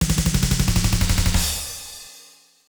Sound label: Battle Startup